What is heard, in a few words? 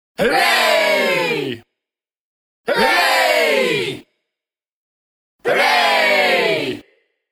Small group of people shouting ‘Hooray’ 3 times; Holidays; Voice